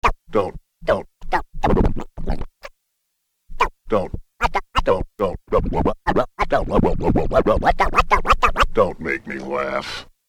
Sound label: Scratch; Sonic Foundry (Sony Creative Software); Infinite Soul VOL-1; Turntable